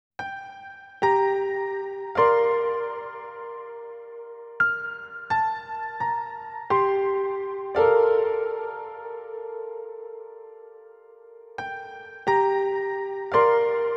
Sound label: piano amb.; Public Safety 12th